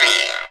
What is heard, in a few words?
Guiro Scratch; Digital Sound Factory